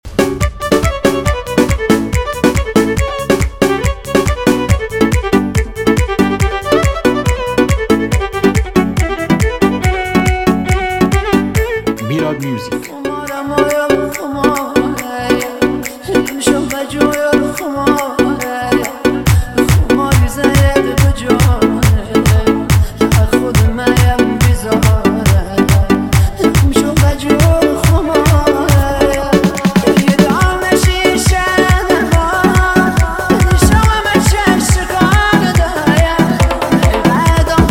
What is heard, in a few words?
Piano Version